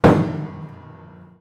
Piano Destroyed demolished broken Wurlitzer Upright Impact string note hit ringing out; buzzing; Matt Script